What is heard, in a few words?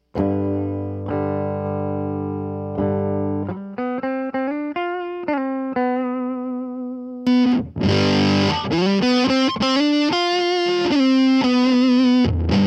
Checkin' the Tones